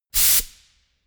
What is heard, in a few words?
pneumatic pump